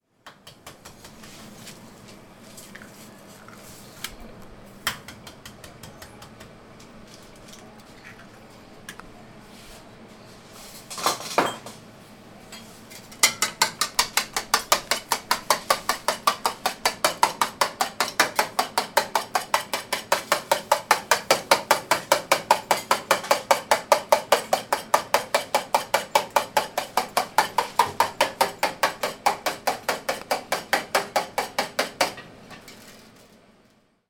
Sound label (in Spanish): huevo batido en plato de hierro esmaltado; bilbao; muga; soundfood